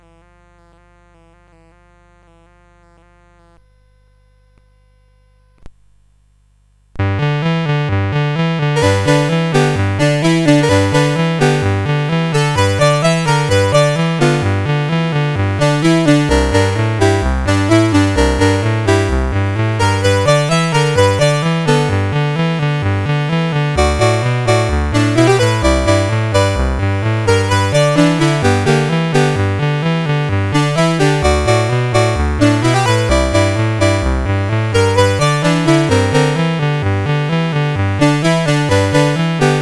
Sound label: Boogie Blues, CSG8580R5